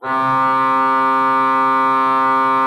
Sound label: C3, Cathedral Organ, HOLZPRINZIP; Propeller Island